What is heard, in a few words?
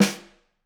Snr, drum